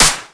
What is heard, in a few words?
Clap Hard; samples